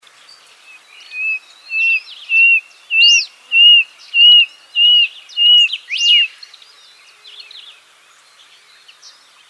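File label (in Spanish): Inambú Montaraz, Nothoprocta cinerascens, Brushland Tinamou; cantos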